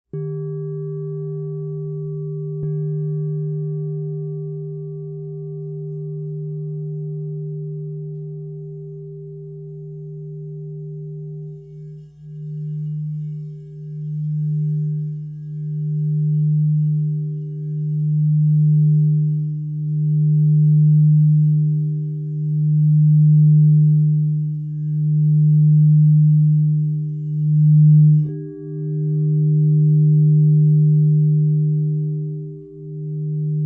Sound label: Crystal Tones Singing Bowls